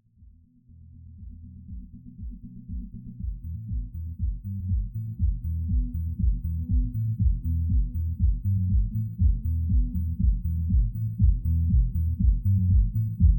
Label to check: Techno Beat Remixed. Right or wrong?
Right